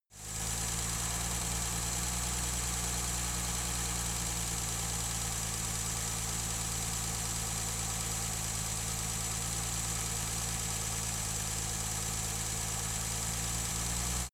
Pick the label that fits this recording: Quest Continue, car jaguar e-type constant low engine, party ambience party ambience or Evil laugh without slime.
car jaguar e-type constant low engine